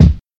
clear bass drum single shot d key, bass drum one shot tuned to the D note; kick